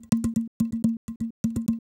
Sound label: bottle, ethno, 125